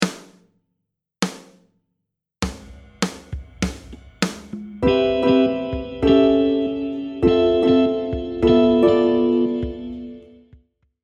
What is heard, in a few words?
i vi ii v7 courts